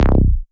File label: Stabs, Elektron MachineDrum SPS1 MKII